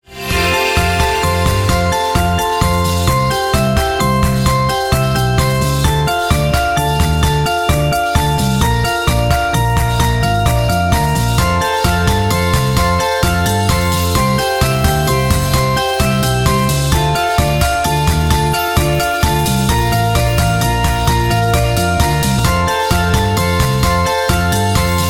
Waltz Time Routine Music

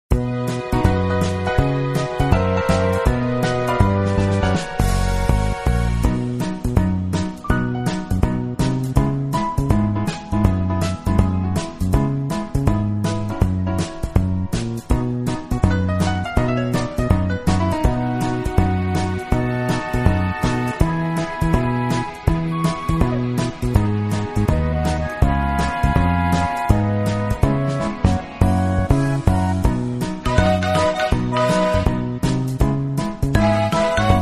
Bring me Backing Track

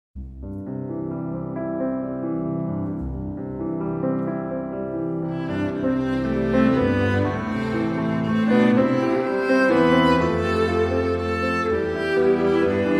Port De Bras; Eamonn A Chnoic

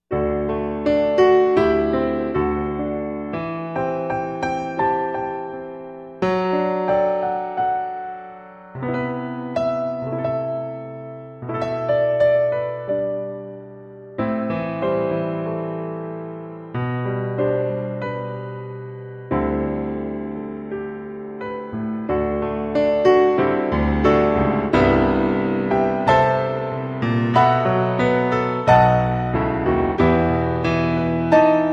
G piano

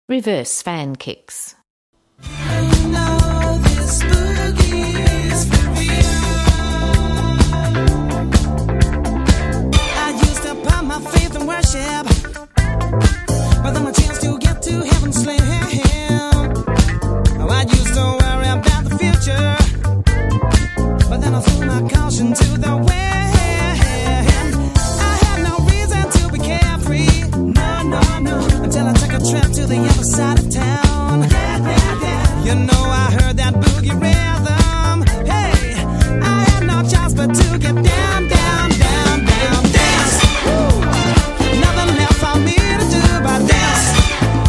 Reverse Fan Kicks; Silver Star

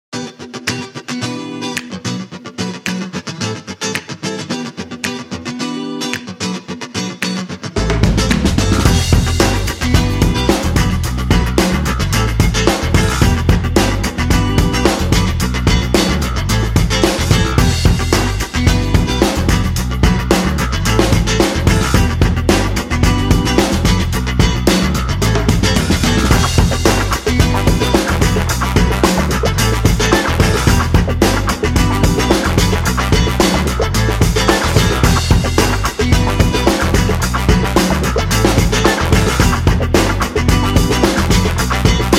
Break Turns Music

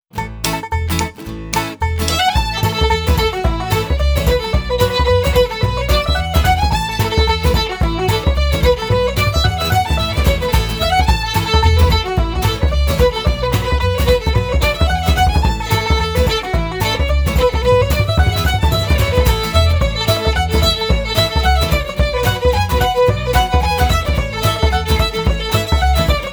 Jumps Continuous

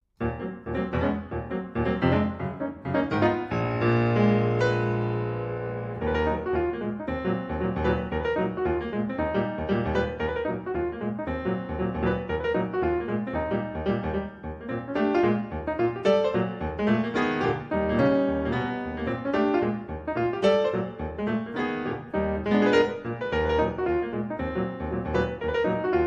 Dance Male And Female Prelude

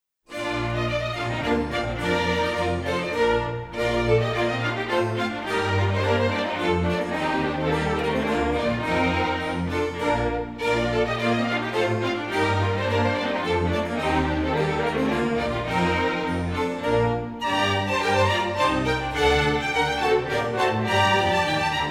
Springs Continuous Hornpipe; Abdelazer

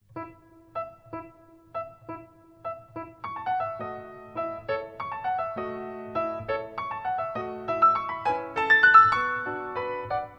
Dance Classical 6/8

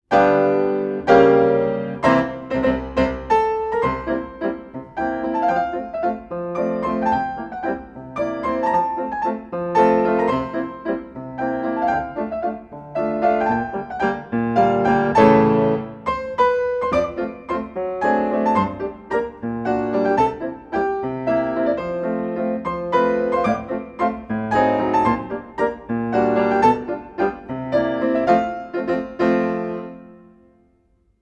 Grands Battements And Grands Battements En Cloche

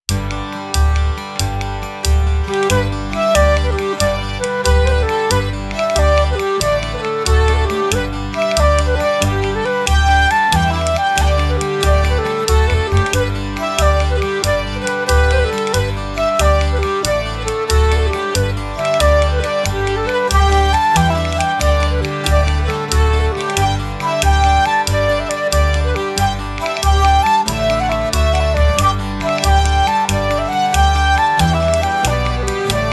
Picked up Galops Continuous A

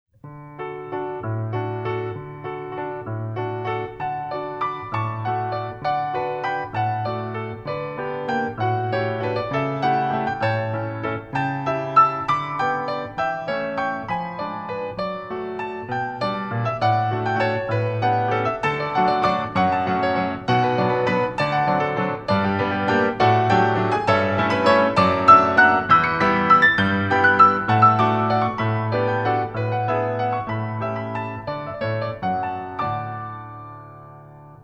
Waltz enchaînement

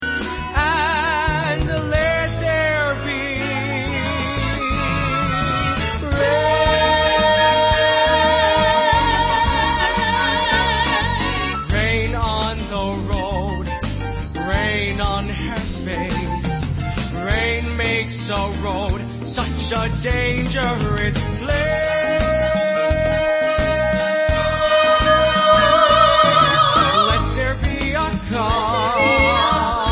Rain Sop

